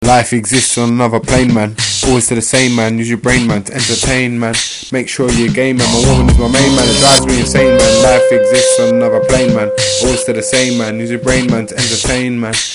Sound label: plane man loop